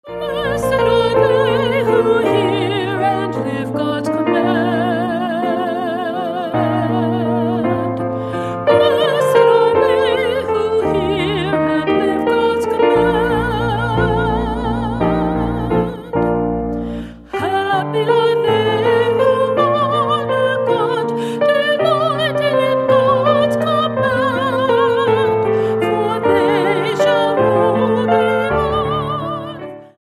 Psalm 112 - God's Command

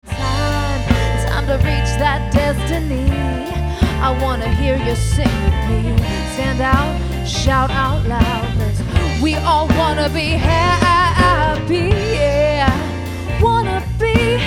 Happy Group

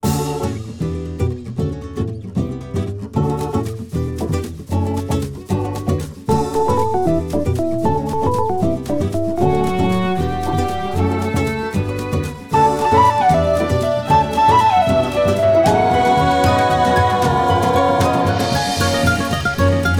happy jazz 70 s

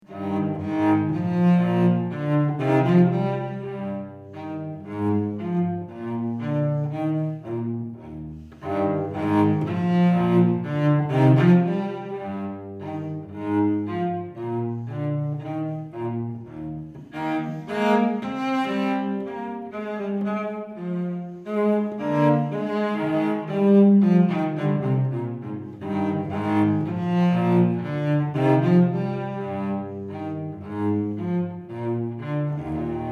Bourré II - Suite nr. 4 in Es Majeur; BMV 1010

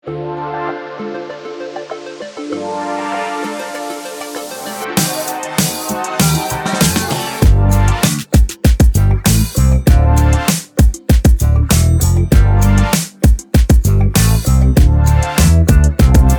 Future Funk

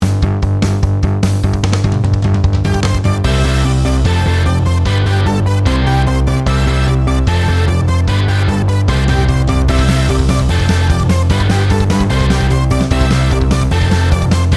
punk; archibald editions

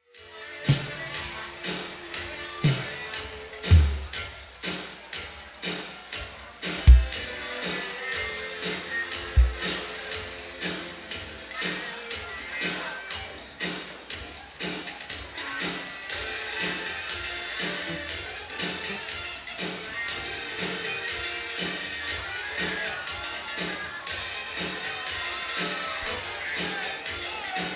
Drums check; PA; Shortberry Strawcake

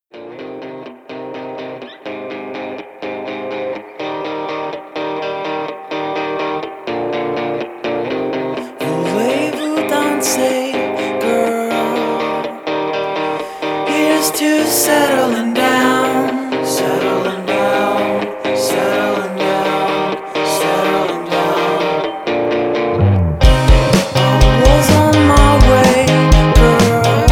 French Song

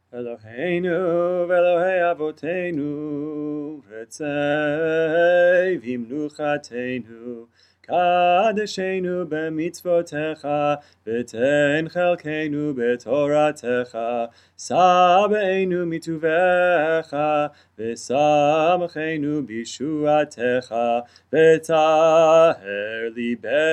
alternate melody; mkadesh hashabbat; Music of Shabbat Morning

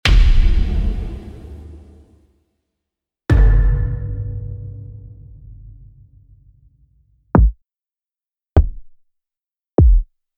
Kicks

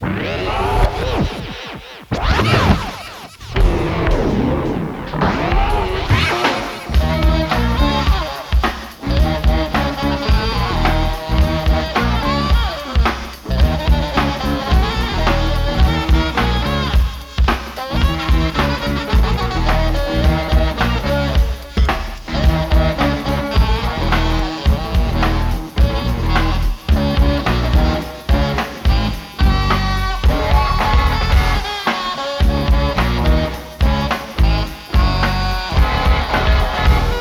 Speed Control Funk

